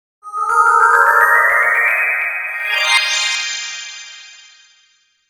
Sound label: Bells Ascend Chimes Shimmer; Production Element Imaging Element Accent Transition; 5.1 STEREO MIX; Blastwave